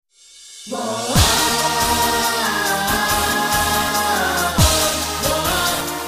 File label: Instrumental